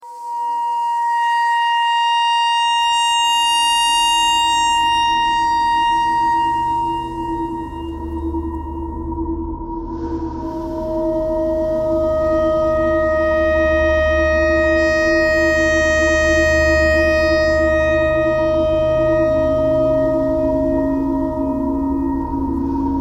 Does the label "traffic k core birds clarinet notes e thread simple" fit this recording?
Yes